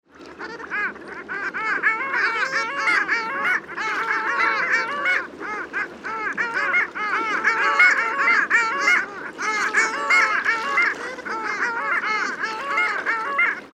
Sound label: Long-tailed Duck Clangula hyemalis